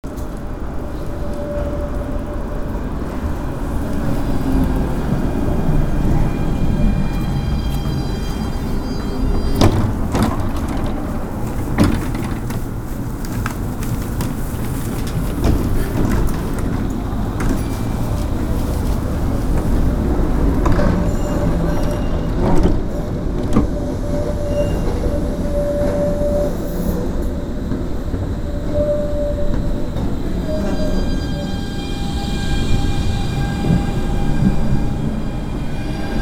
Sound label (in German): Tram; Friedrich Wilhem Platz